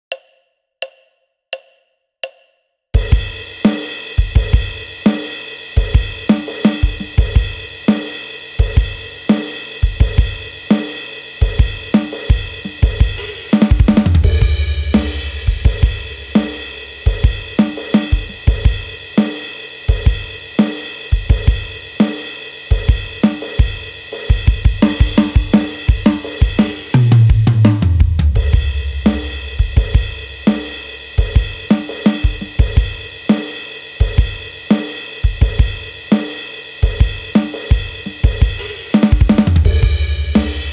drums Riff

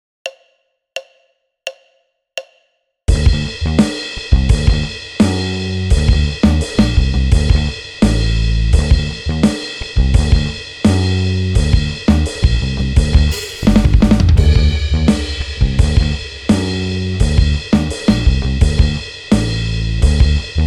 bass Riff

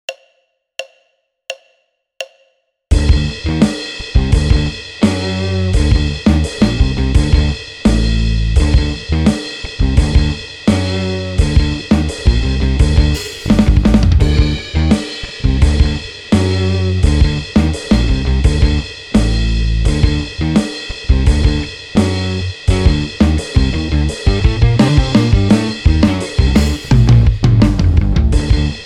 GT et BASS RIFF

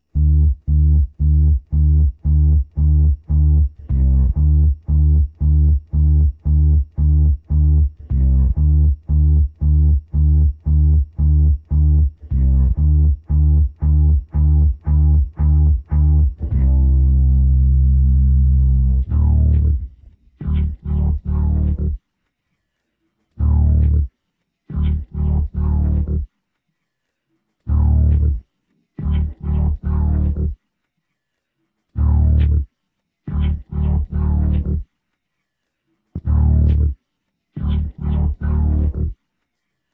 (Bass)

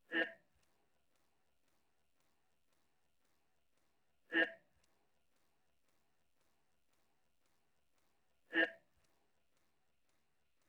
version (Vocals)

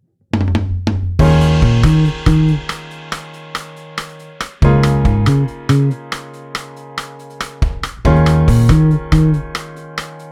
playback A

